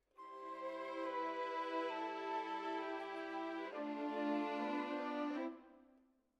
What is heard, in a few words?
Violins, jazz for piano